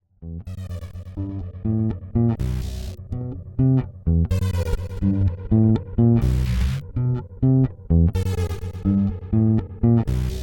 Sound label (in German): fxbox E Bass